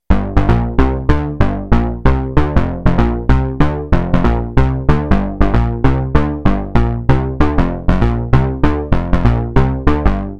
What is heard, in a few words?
Synth bass chorus; analog synth; Penetrovisk